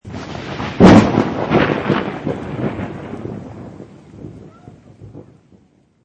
Thunder Crack Loud, Loud thunder, close thunder crack.; Weather